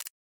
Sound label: screenshot; Xiaomi Redmi-Note-8-Pro